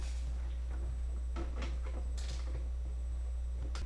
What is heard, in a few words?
glide